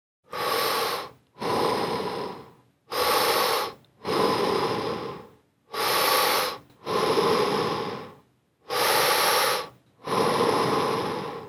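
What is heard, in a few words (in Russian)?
звук дыхания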